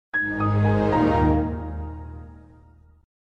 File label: microsoft windows xp shutdown sound